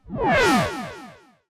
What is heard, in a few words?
SFX Boss Battle Battle Buff Down; Era's Time Delivery Service